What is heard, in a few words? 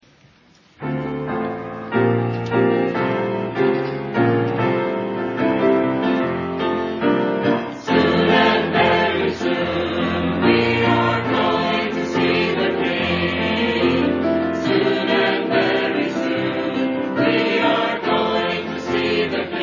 choir